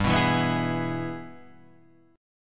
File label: G sharp Minor Major 7th Sharp 5th